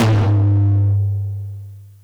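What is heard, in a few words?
TOM loud; Zero-G; Chemical Beats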